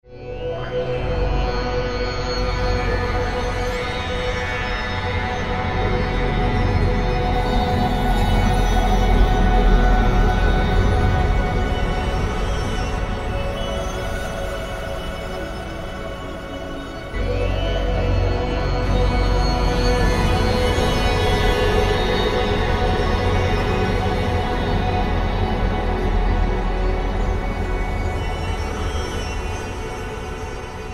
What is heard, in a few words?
Indian Voice Morph Strings